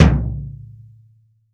BD, REAL DRUMS, JUNGLE KIT

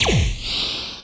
FX Dance

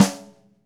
Ludwig Supraphonic Snare Room; Sonic Foundry (Sony Creative Software) - Vital Drums

